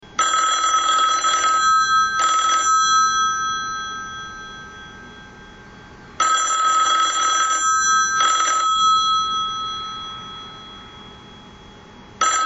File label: old phone sound